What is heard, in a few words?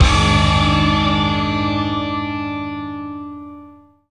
Exiting Vehicle Jingle; The Simpsons Hit & Run; PS2; Burning Rubber